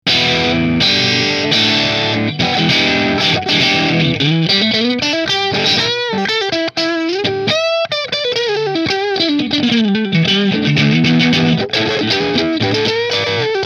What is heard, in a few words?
Driftwood Middle Middle Marshall; Nola Model 8